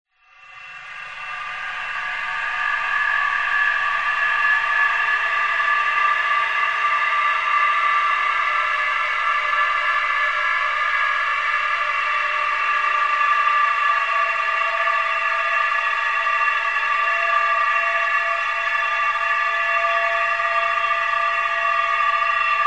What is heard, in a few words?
science fiction atmosphere thin high pitched whine airy desolate; zapsplat